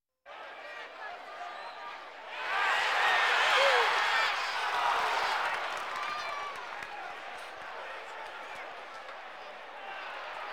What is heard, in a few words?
Sports crowds; Valentino Production Sound Effects Library CD50